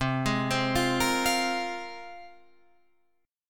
C 7th Suspended 4th Sharp 5th, Guitar, Drop D

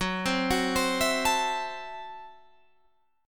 Gb Minor 7th Flat 5th, Guitar

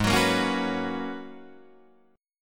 G sharp Minor 11th G sharp Guitar Drop D, chord

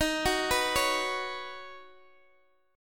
D sharp Minor 7th Sharp 5th, Guitar, Open D

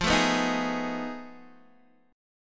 F 7th Sharp 9th Flat 5th, Guitar, Open D

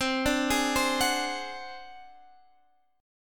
D 7th Flat 5th C, chord

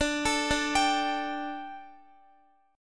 D Suspended 4th D, Guitar; Dsus4; arpeggiated; x,x,12,14,14,14

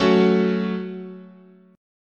F sharp Minor, guitar chord

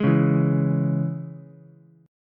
Ab Suspended 4th Sharp 5th Ab, Ukulele; 1,1,0,x; strummed; JGuitar

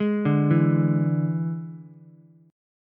D Suspended 2nd Flat 5th D Ukulele; 1,2,0,x; arpeggiated

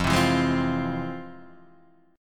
F Minor Major 7th, FmM7 chord; FmM7 strummed; JGuitar

